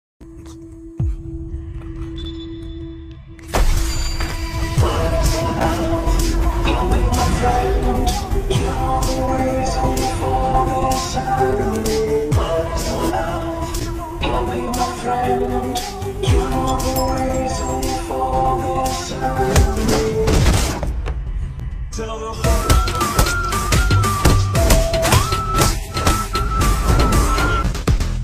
bgm villain ringtone; vikram

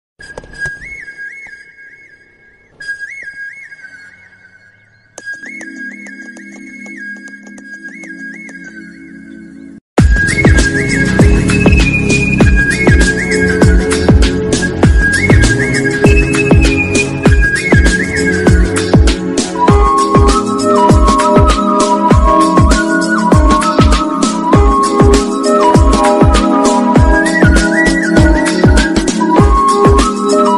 bike bgm ringtone tamil bgm ringtones; polladhavan

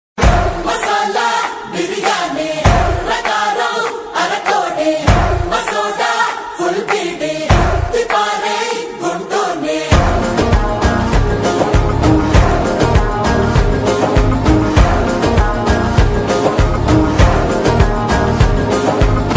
mass music ringtone; Guntur Kaaram; Mahesh Babu

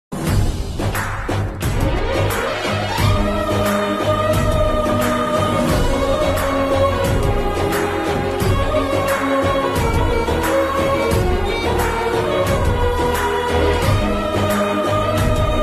climax bgm ringtone movie background music

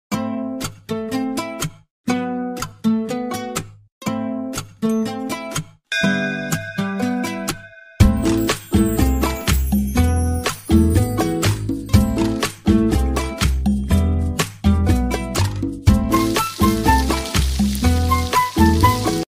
bgm ringtone, telugu ringtone; Krishna Vrinda Vihari

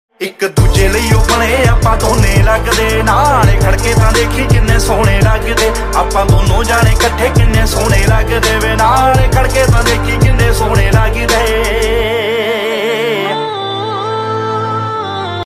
punjabi songs

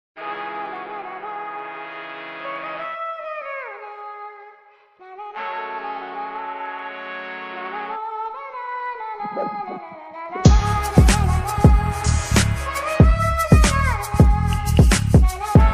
bgm ringtone tamil bgm ringtone; manjummel boys

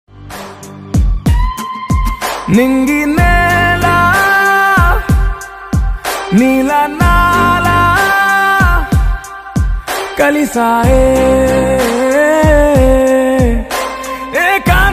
ringtone telugu

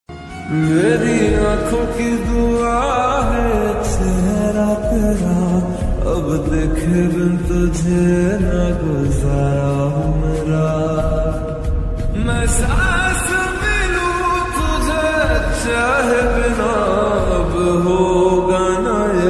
hindi ringtone, love ringtone, punjabi ringtone

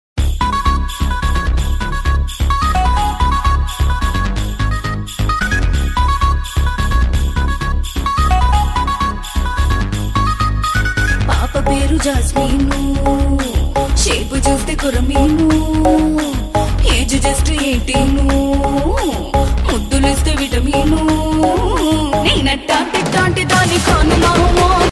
song ringtone telugu ringtone; nmbk movie

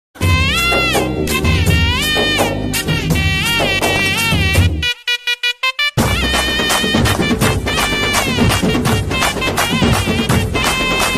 tamil ringtone

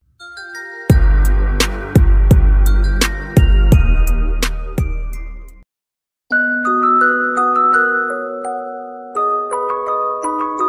ringtone instrumental, piano

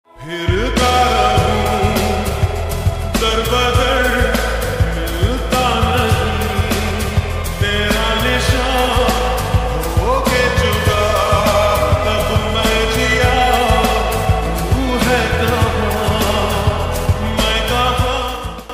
ringtone

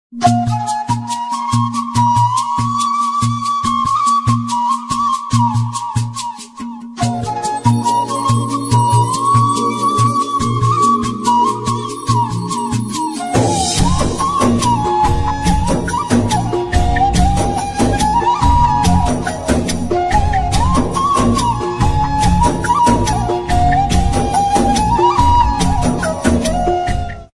sad love bgm ringtone

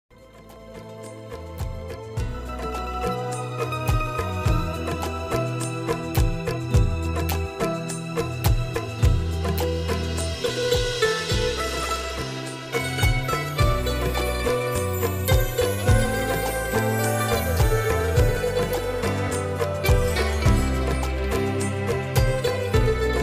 drama ringtone drama background music; Ishq Murshid

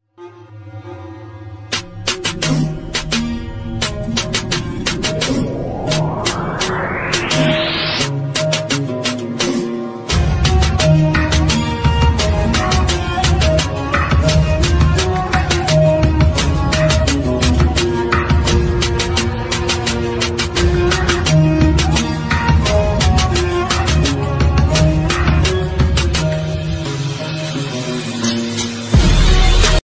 attitude ringtone bgm ringtones english ringtone

